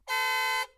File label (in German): hupe